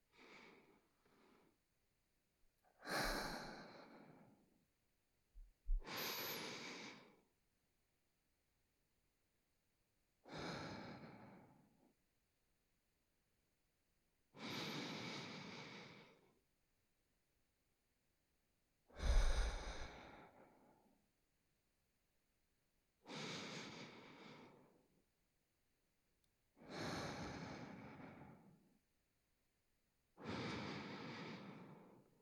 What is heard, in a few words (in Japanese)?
寝息（右）鼻息